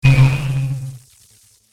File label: impact; cstrike; war3source; vengeful